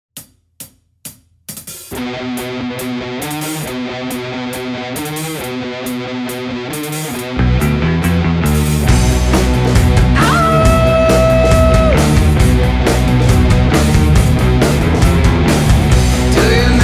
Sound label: Black Forest Disco; Art Project; Goat